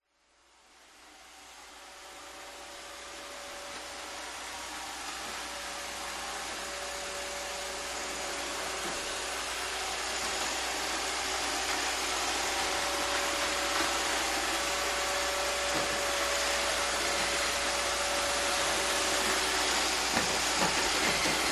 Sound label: household vacuum robot approach then vacuum around close tiled floor